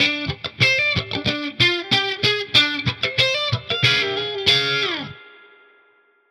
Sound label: Guitar Line, hiphop; musicradar; 94bpm